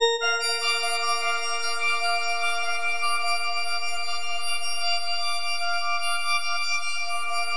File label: A# MAJ, Granular; Bolder Sounds